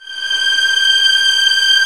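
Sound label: violins, arco, slow; String Master Series; Vlns 2-5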